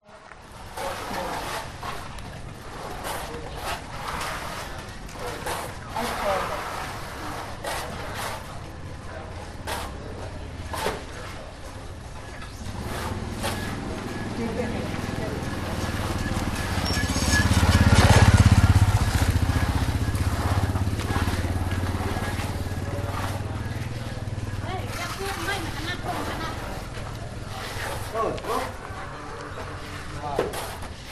construction house building street occ car Cambodia